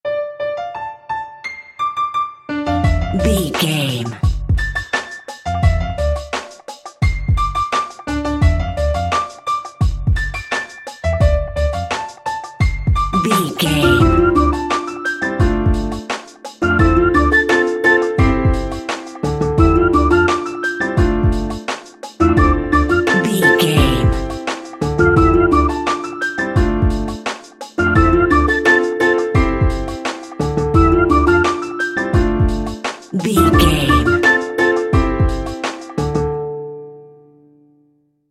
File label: music fun funky gnome